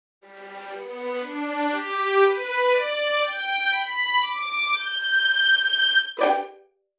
Skripki

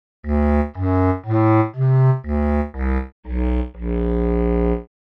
basovyj klarnet

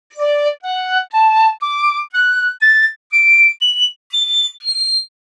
flejta pikkolo

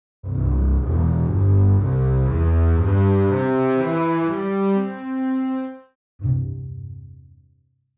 Kontrabasy

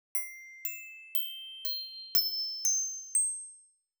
Kolokolchiki

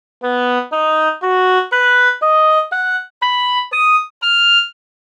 goboj

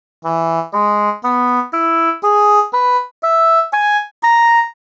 anglijskij rozhok

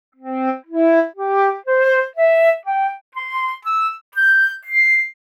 poperechnaya flejta

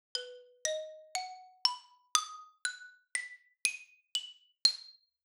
Ksilofon

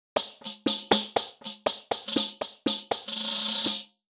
Buben

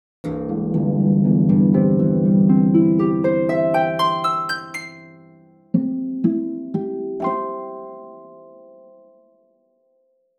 Arfa

